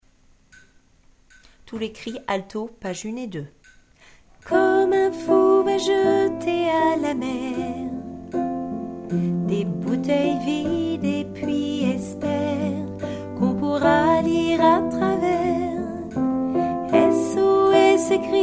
alto

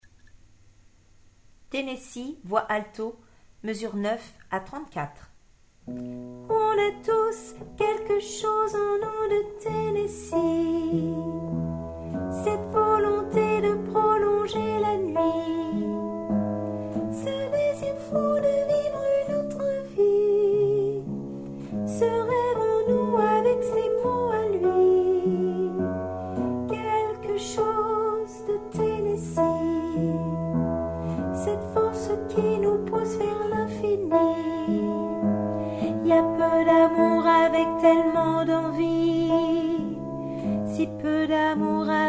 alto

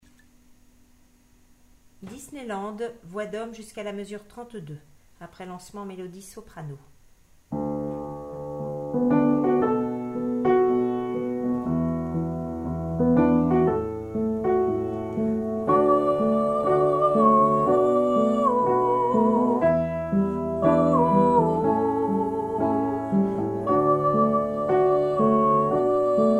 hommes

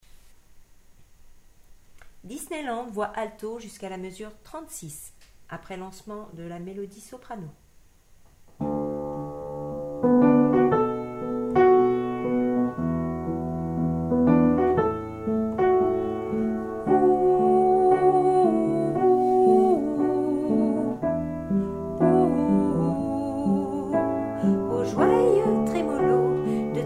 alto

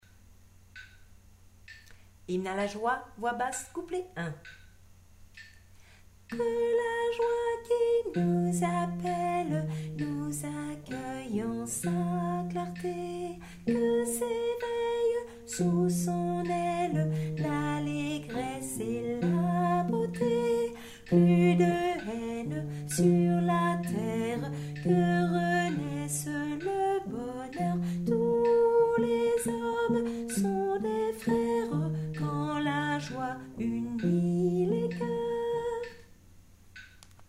hymne la joie basse tempo 65

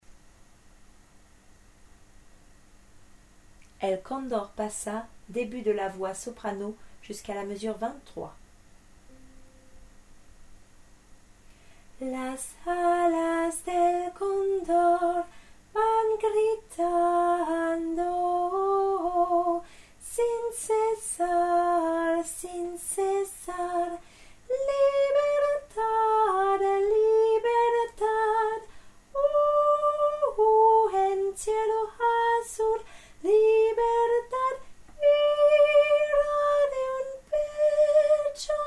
el condor pasa debut soprano

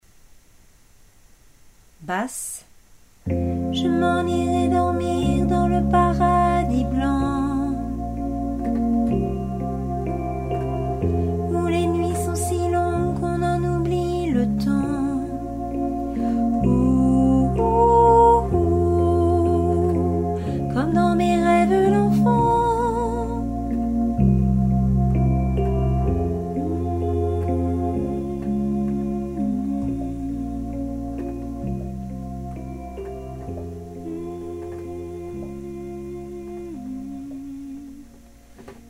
debut ref. basse

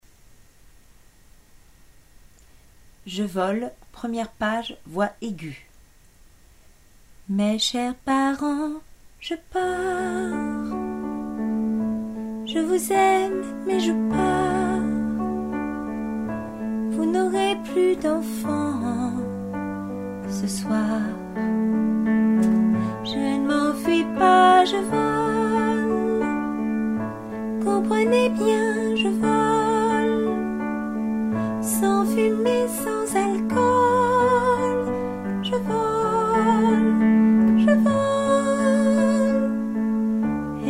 voix aigues

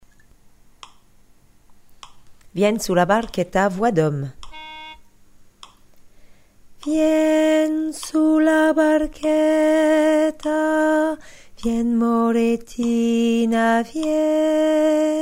hommes

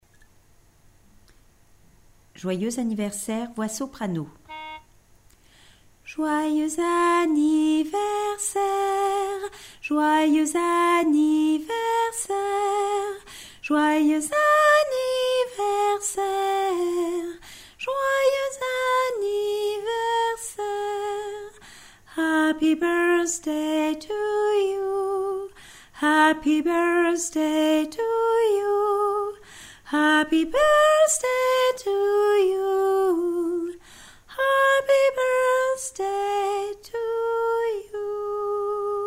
joyeux anniversaire soprano tenor

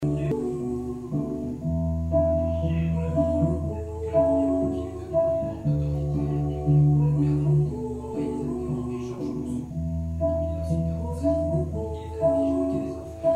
3 voix; disneyland

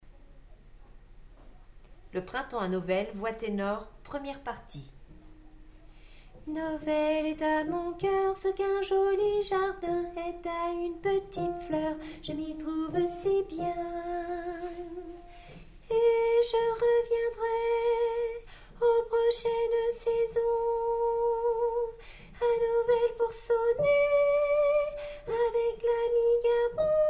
tenor 1 partie; le printemps novel

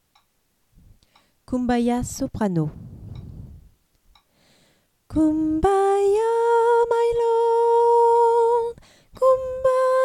soprano

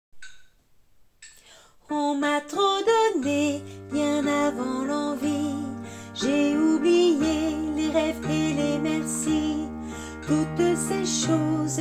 refrain alto

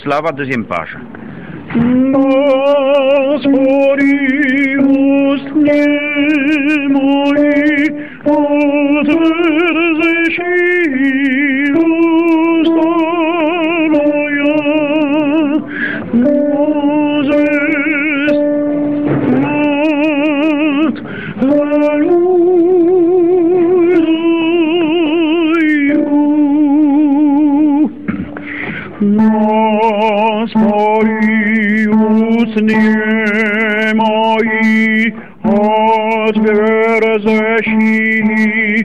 tenor

